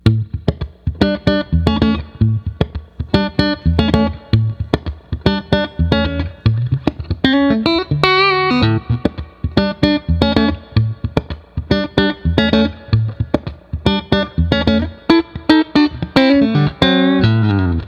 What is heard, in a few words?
56 neck middle, pickups